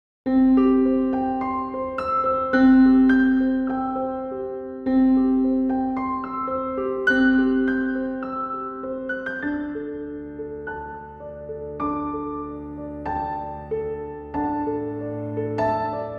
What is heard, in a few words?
Piano Magic Motive